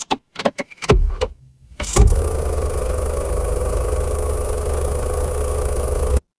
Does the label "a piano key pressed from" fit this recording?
No